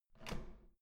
door handle up soft

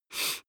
nose sniff male soft; koerpergeraeusche; menschen; soundpool

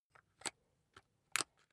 rock drill head attach soft; maschinen; bohrer; soundpool

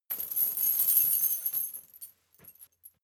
metal chain midsize handling soft

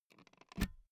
pull out euro plug; objekte; elektronik

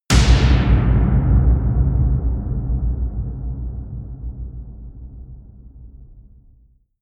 fire boom; hits-explosionen; impacts

